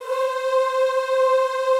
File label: C4 L, Classical Choir; Best Service; Extended Classical Choir